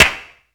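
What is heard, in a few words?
steel snare drum sound a key, tuned to the A note; snare-drum-samples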